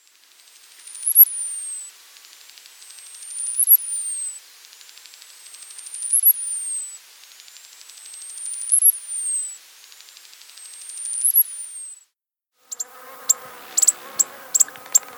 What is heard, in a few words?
Costas Hummingbird